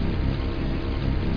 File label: bee; Machines